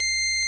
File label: ORG Vox Continental, Keyboards of The 60's and 70's